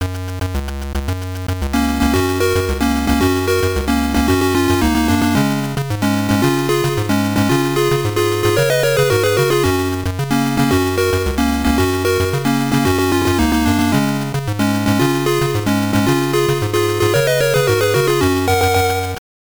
Level Complete; Speedy Gonzales; GB

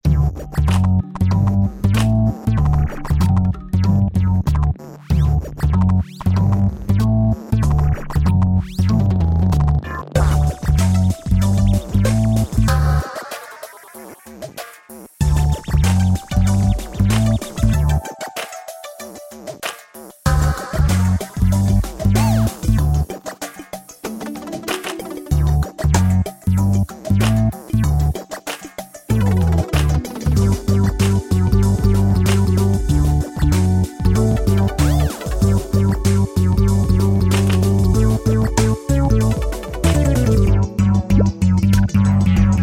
Robot Music; Doki Doki Universe; PS Vita, PS3, PS4

